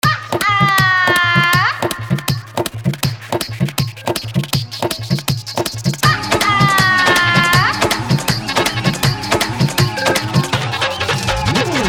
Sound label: Old is Gold Hit Viral Fully Dhollki Bass Remix